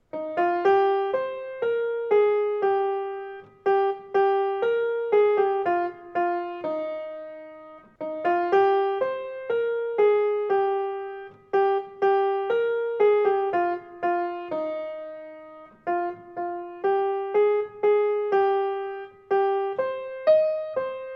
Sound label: Munich MELODY